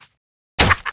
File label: Goblin Run